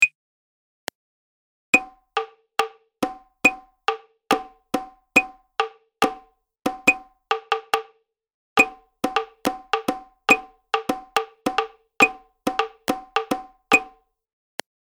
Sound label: Prepared Rhythm; advanced musicianship; Practice Sheet 5